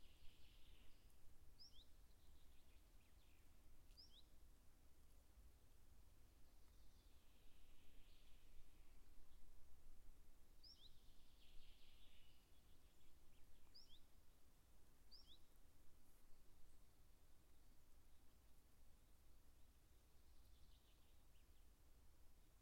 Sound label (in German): zilpzalp